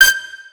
Leed Melody

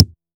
soft hit normal

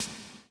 soft hit whistle

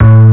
bass; osu

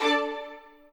strings

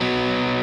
Arp. Bsus4

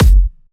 drum hit normal

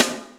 SN Rim; osu

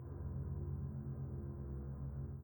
chord

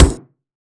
drum hit clap; osu

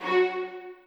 strings

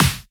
Snareclap

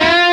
bend full

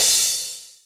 cymbal; osu

